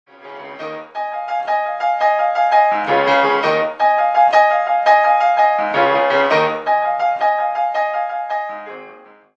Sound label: piano